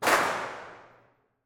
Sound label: Large UI Gun Shot; Nova Sound